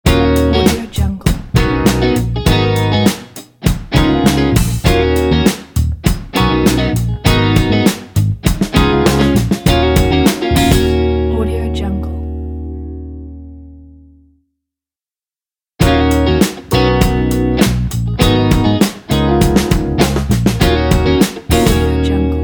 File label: be joyful ident